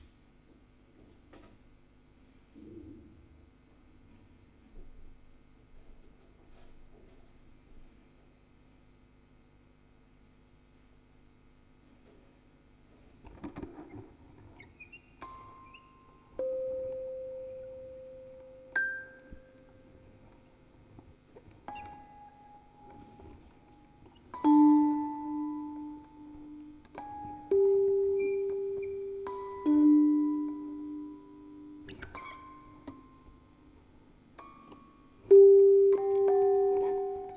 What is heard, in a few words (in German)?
Aufnahme Spieluhren Quartett 4 Kanal stereo